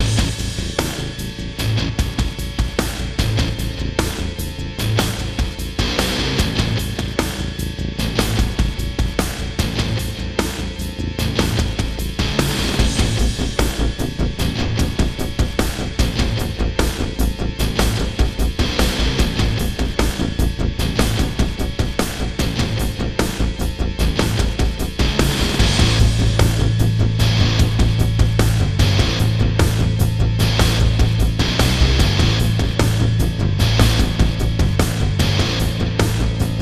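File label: no orchestra loop; transformers war for cybertron gamerip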